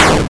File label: Explosion; little robot sound factory